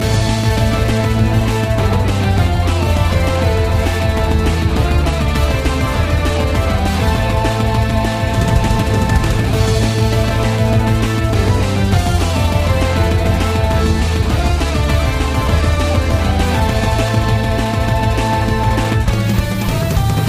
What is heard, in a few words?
fight theme fast loop, music; Mega Fighting Bots